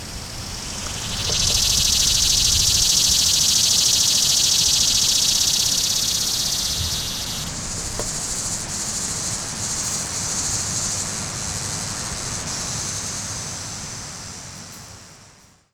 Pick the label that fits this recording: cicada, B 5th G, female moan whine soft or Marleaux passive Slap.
cicada